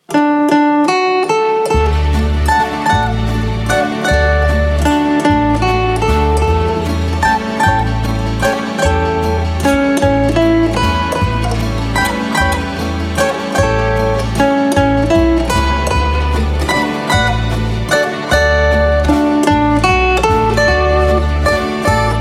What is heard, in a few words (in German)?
wiener walzer wiener lieder